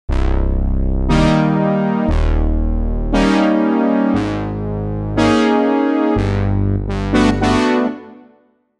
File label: Lo Brass, Jupiter8; OPXPROII; opxpro2